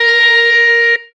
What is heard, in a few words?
electric guitar